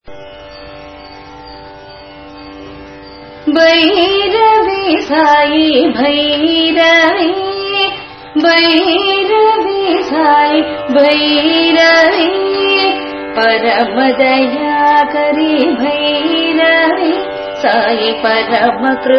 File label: Bhajan; Bhairavi Sai Bhairavi